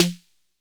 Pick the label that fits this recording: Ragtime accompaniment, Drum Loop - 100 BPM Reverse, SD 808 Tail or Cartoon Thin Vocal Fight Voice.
SD 808 Tail